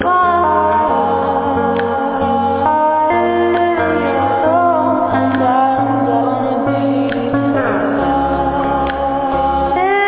sad musical

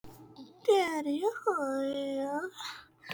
hhhyyyyyaaaaaayyyhhya

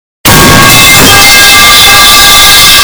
metal pipe smash

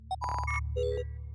computer accepting sound

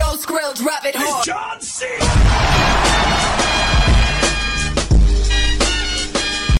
bass drop extreme

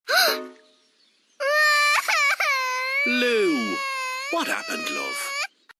crying; ladybird lu

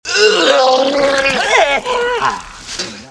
puke

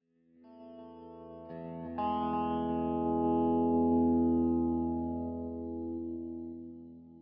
riff; paintball

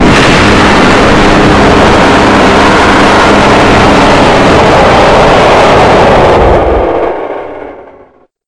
jumpscare; five nights at stellas 4

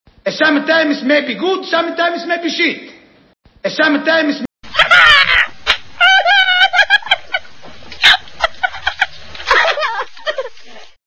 sometimes crazy laugh

